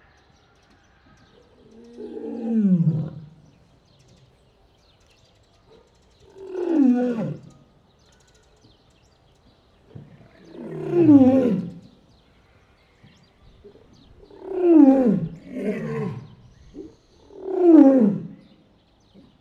szabadban, 20 Hz, oroszlán; debreceni zoo 2012

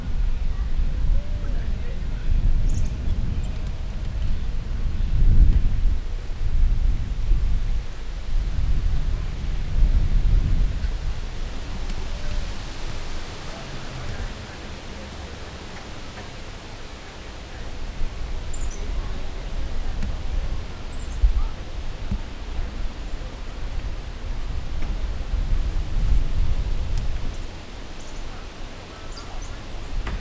gyenge kis hangocska erősszel, apellacsuklyásmajom; gyori zoo 2014

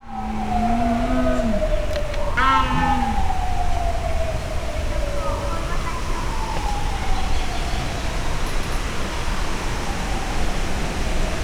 egyre távolodó állat szeles idő, leopárd jaguár; szegedi zoo 2011

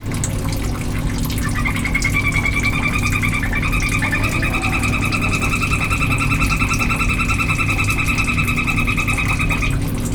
kétszer megszólal, nyílméregbéka; pecsi zoo 2017

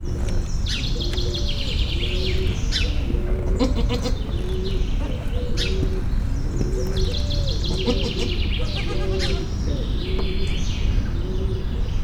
anyaállat és gidája, házikecske; szegedi zoo 2011

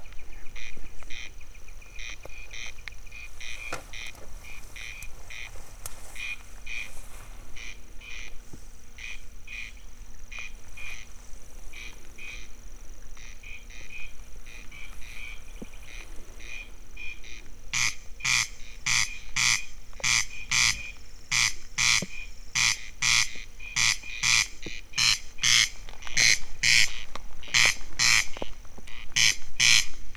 arrébb fordulok madarak csepegő eső, haris; Teresztenye

